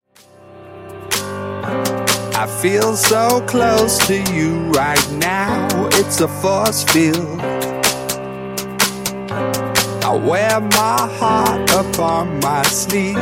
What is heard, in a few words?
Funk Bootleg 125Bpm